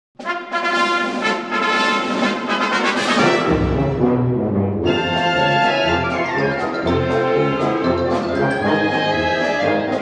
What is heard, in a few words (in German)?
Sonntagsmarsch; Stolpersdorfer